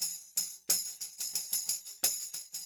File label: tam; Percussion